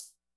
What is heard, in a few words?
hihat, Acoustic